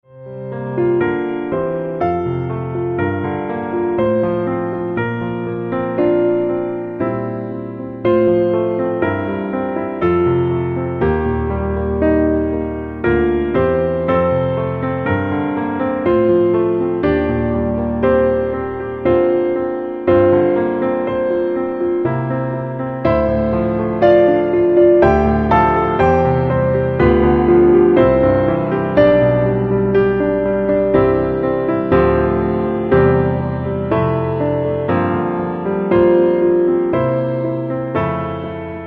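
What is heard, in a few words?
Lead Kindly Light, piano low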